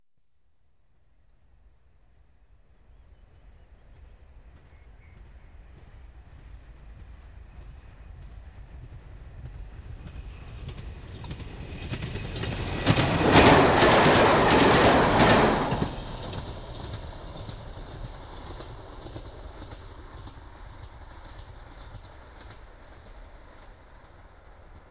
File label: Trains Passing Diesel railcar; BBC Sound Effects Library